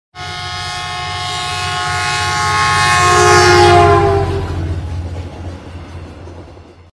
som de carro passando batido

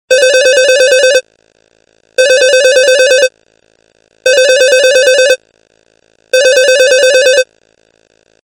toque classico de telefone

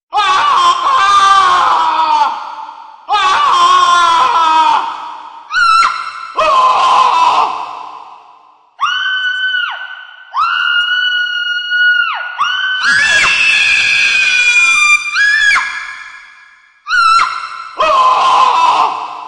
mensagem grito fantasma

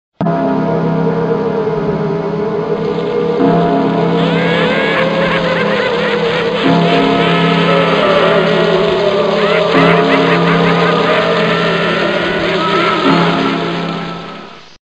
sinos de terror

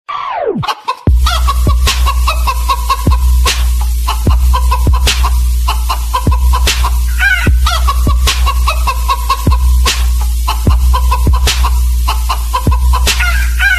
musica da galinha para celular

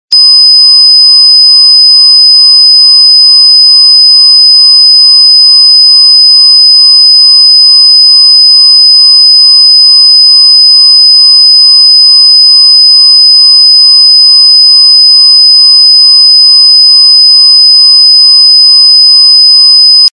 som agudo alarme

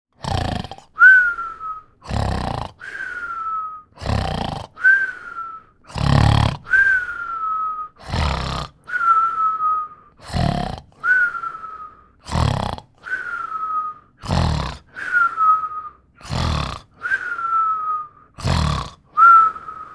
ronco efeito sonoro

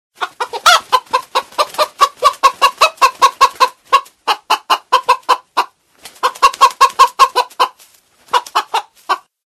som de galinha

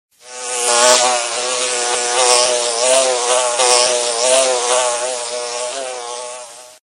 sons de abelhas

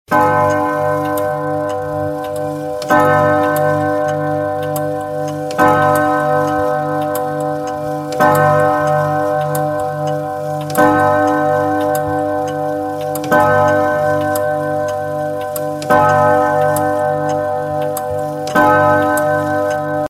barulho de sino de igreja

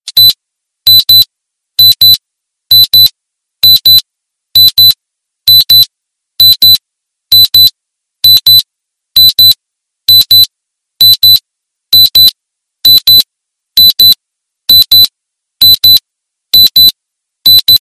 som de monitorar coracao

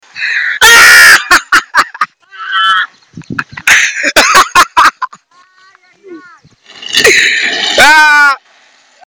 risada alta; toques para celular

